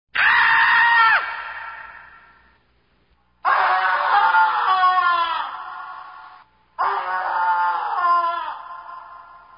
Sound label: Screams